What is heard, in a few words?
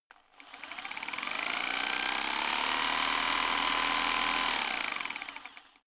rock drill chisel fast; maschinen; schrauben drehen hydraulik